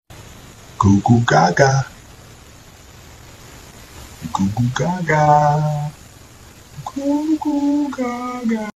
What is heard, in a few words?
goo goo gaga